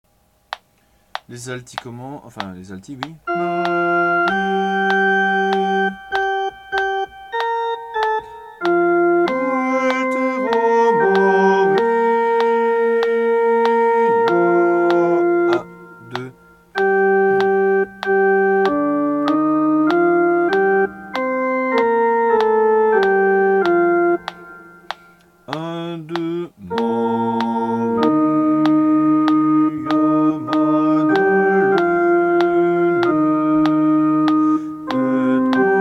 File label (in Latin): A Maria Magdalene Basse; Gabrieli